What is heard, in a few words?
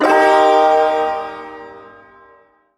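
Shawzin Minor Chord; Warframe; Duviri Ersatz Shawzin; Chords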